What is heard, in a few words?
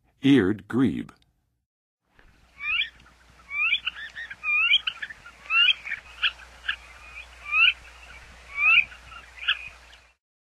Eared Grebe, Songs of Birds of North America; Western USA; Western USA Songbirds